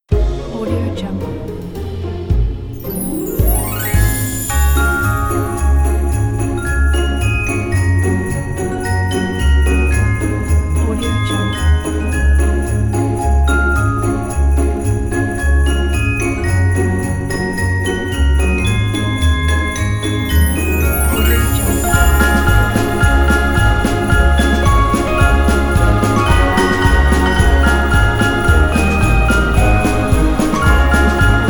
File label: jingle bells christmas pack